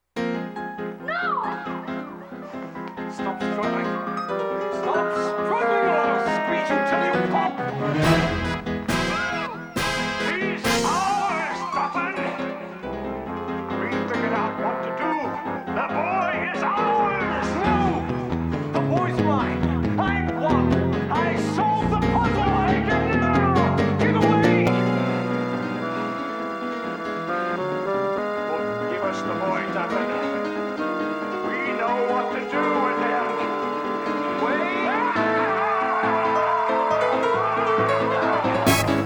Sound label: piano fight; 7th Guest; CDi